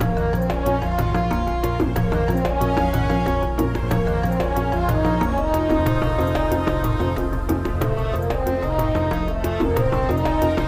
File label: mine cart